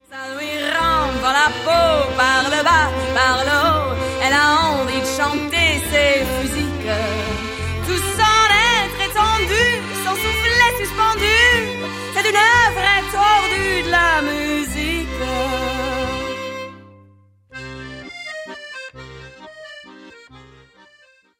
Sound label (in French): Accordeoniste